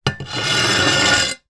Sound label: foley mirror no frame drag on table; sound effects one